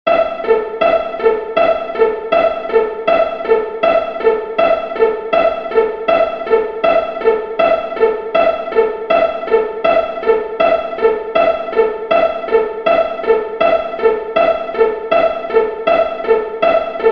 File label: Crosspicking 80bpm, mandolin